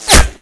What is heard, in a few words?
impact flesh; Modern Warfare